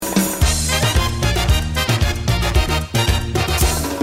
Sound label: maraca